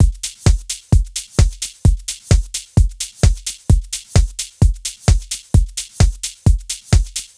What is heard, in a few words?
Full Loop 125, Loungin House; Equipped Music